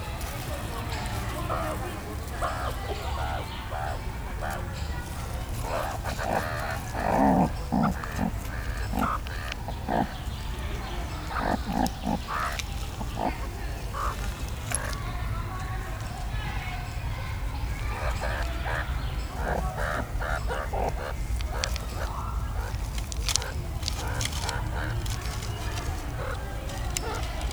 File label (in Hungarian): délután, szurikáta; szegedi zoo 2011